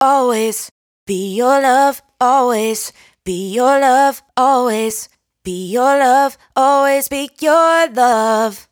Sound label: hook, 110, Bm, Vocal, Dry Vocals; Raw Vocals; Slate Digital Omnivox Vocal Sample Pack